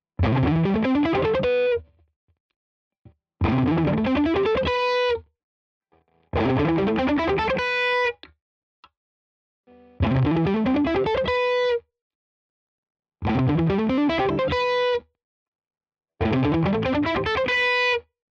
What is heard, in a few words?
Schecter Exotic Ziricote crunch Penta all positions